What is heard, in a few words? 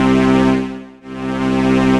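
Solina Full Tremolo, String Machines, ARP Solina; Ableton; Factory Packs; Retro Synths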